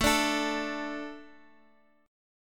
D 5th A

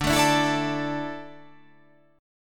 D Suspended 2nd D down

